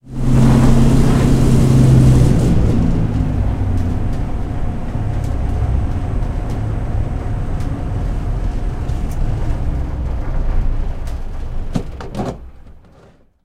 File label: engine lancaster stop cockpit; War Thunder